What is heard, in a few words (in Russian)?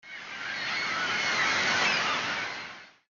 priboy chaiki, teatralnye shumy; Прибой чайки